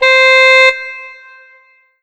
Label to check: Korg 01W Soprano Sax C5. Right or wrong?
Right